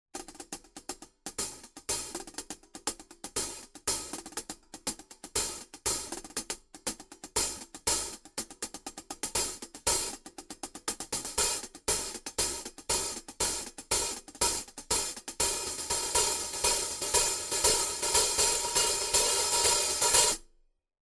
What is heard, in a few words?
sE8 Hi Hat; sE Electronics; sE7 vs. sE8